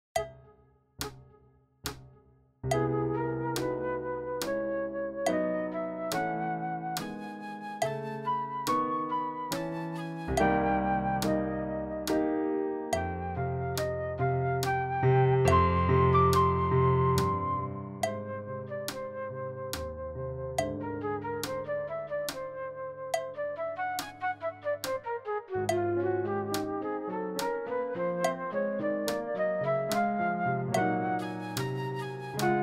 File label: piano flute fast; Uebungs-CDs; Playbacks